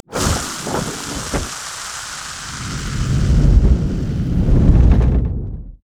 barrel roll foam; LoL; gragas

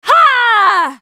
spell cast effort, en US; Riven

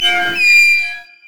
brazier chains groan

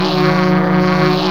meditate loop; masteryi

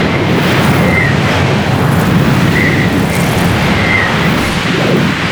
cryophoenix glacialstorm loop; LoL; anivia

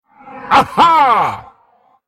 spell cast effort, en US; dandychogath; Character Voices - English

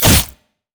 hiddenblade; Zed

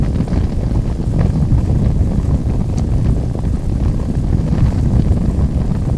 twinbite dragon; LoL; shyvana

